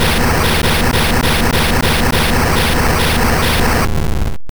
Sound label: Flamethrower